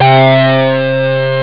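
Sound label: Lead; SynthSamples2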